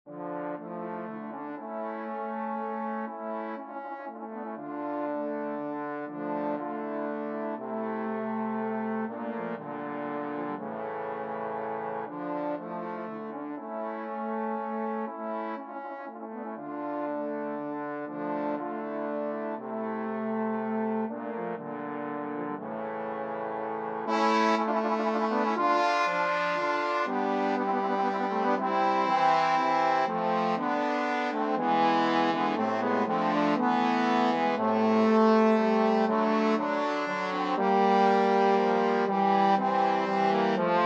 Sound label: trombone quartet